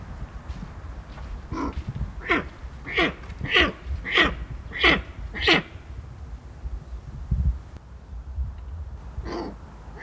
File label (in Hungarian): monológ szélvédő, pusztai sas; állathangok; nyíregyházi zoo 2010